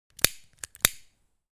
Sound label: Lighter click lighting up